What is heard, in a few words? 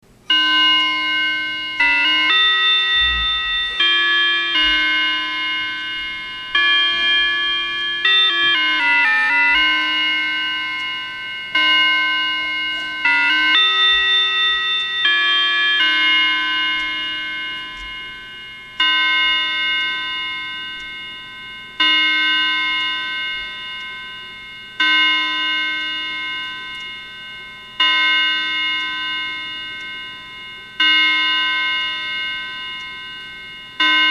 Ave Marie Chime